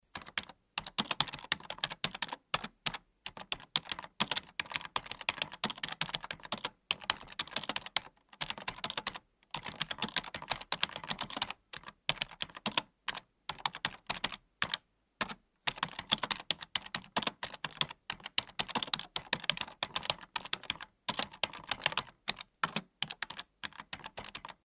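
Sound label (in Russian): pechat teksta na klave, typing text on a keyboard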